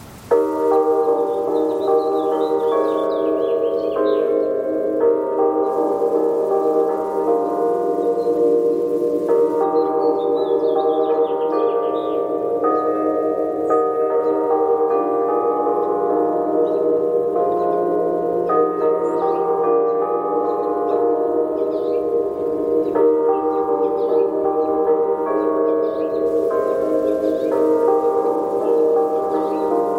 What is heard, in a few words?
Clock Tower